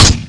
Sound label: alien rifle; garrysmod